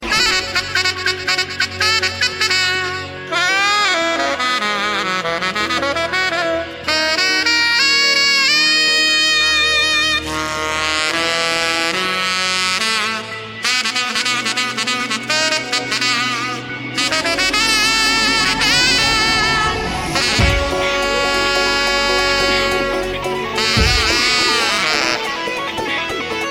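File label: Saxophone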